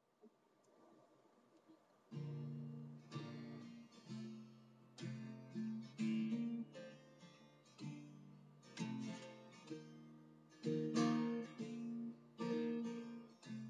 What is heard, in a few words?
chords